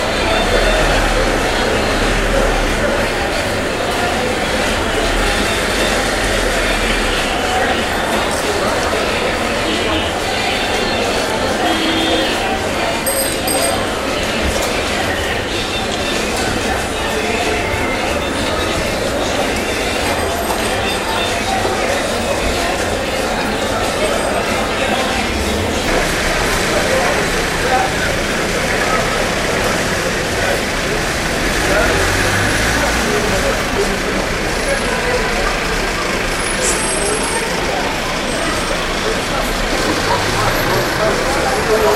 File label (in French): bazar